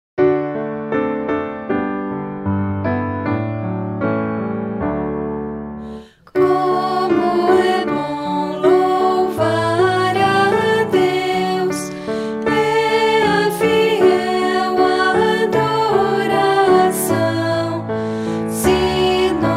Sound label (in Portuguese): voz piano